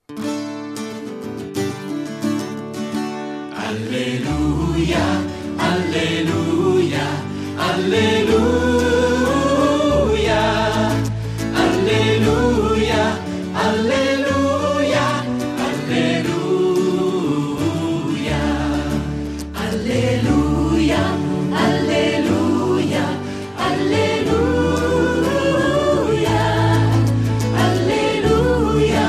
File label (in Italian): alleluia concordia